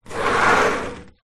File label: household screen door insect slide